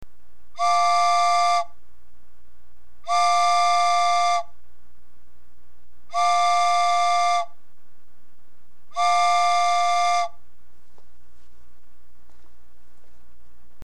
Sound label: small train